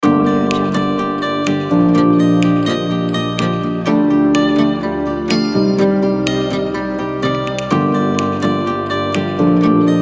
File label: inspirational ambient pack